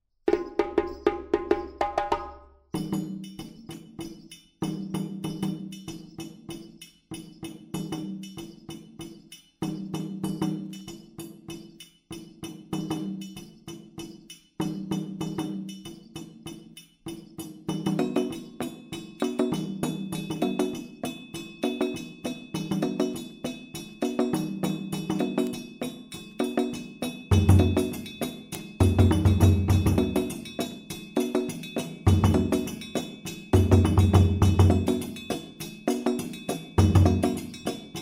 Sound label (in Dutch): Toro Opbouw, djembe